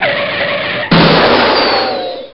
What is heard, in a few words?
Power Mac 6100 (Death)